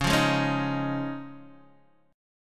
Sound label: Db Major Add 9th Db Guitar DADGAD; strummed; x,4,3,1,4,1